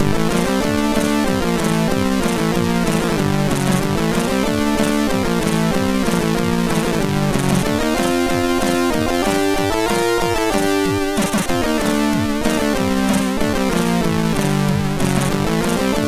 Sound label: Cover, sid